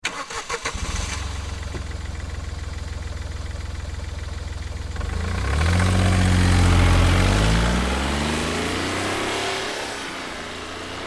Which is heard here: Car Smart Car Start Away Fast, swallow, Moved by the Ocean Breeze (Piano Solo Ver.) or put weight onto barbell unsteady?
Car Smart Car Start Away Fast